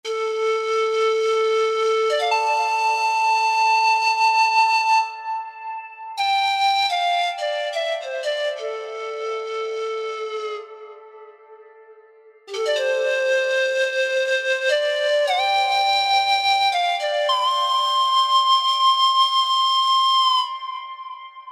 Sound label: Combinator Andean Pipe Dream, Reason 3.0; Propellerhead Reason 3.0